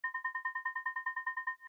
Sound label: test blip